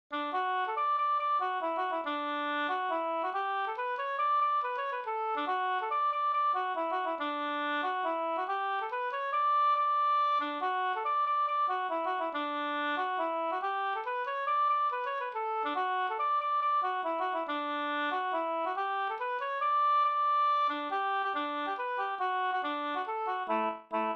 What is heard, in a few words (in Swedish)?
polska hambopolkett